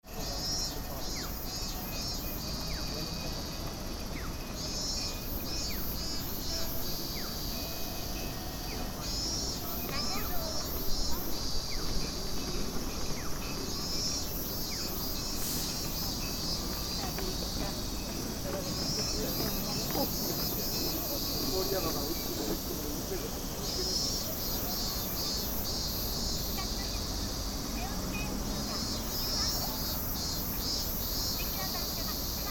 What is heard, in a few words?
station road, fukushima; ekimae